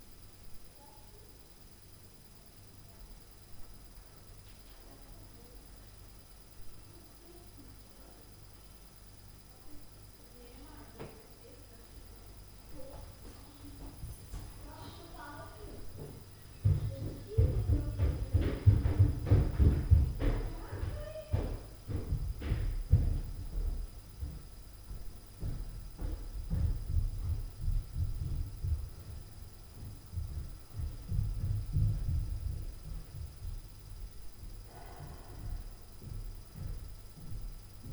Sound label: STAIRS NOISE, Night Noise